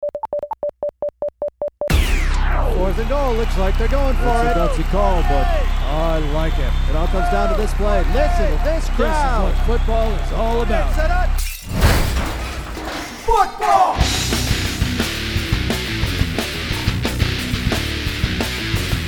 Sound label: 4th and goal open no announcer, metal; 4th and Goal Theme Song; Ed Makes Things